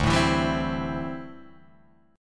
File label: D 9th Suspended 4th D down Guitar Drop D